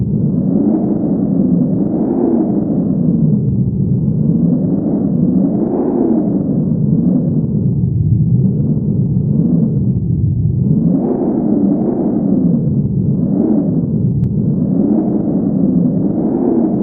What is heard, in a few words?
Wind; smbx38a; The Great SMBX 38A Contest I; Longroad